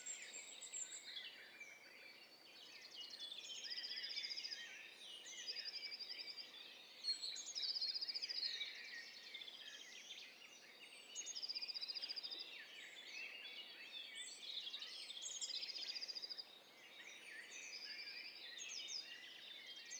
STEREO BIRD COCKTAIL PARTY

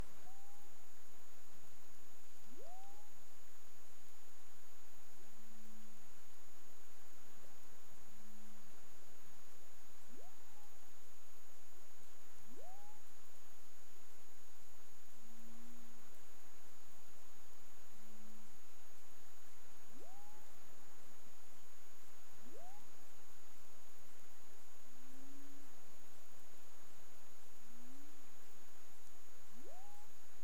humpback whale; CARIMAM

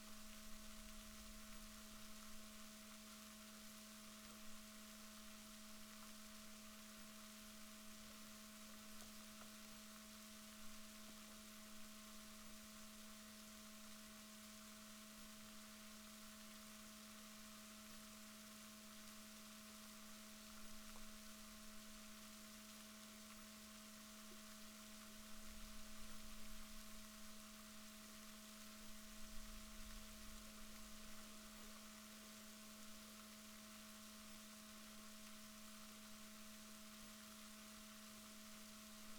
rorquals positif, Balaenoptera Physalus Chile

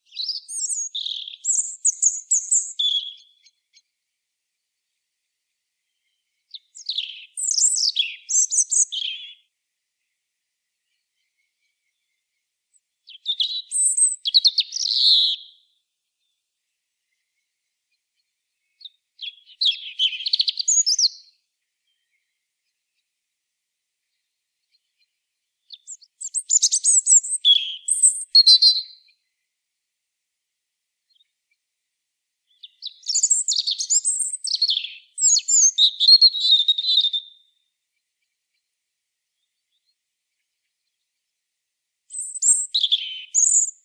Erithacus rubecula; SETE

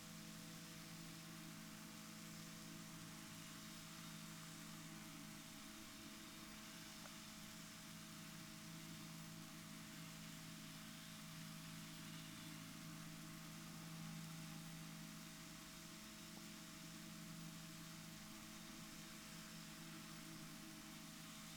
rorquals negatif, Balaenoptera Physalus Chile

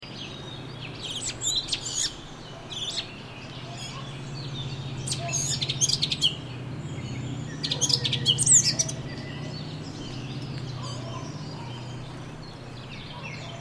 Sylvia atricapilla; SETE

